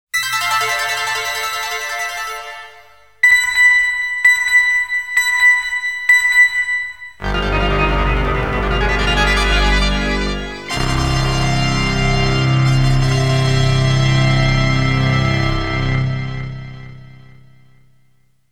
STAGE CLEAR WITH THE RED CRYSTAL (Clear Demo - Pattern Clear BGM); Castlevania Red; Akumajo Dracula